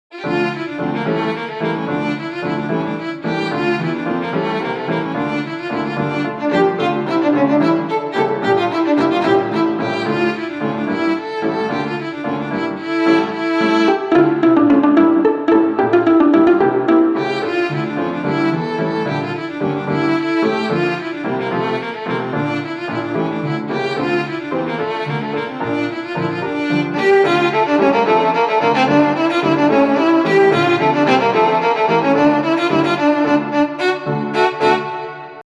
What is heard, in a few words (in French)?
Bourree